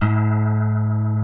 GUITAR FX

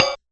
Realistic Concertmate-670 Kit Hat; One Shot Kit

